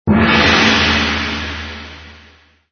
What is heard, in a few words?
Gong